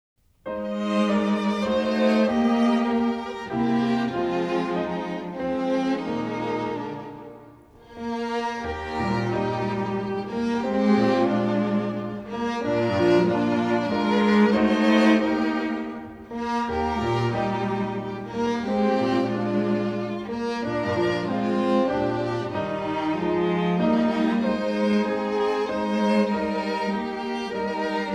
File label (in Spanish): musica solo; por campos de trebol paseo